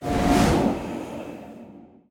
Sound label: Reroll blessing